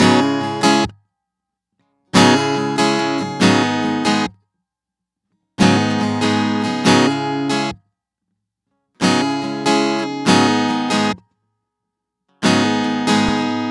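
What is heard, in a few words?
Guitar 140 BPM C# minor; Guitar Loops; River; GhostHack; Ghosthack Advent Calendar 2021 - Day 10 - Guitar Loops and One-Shots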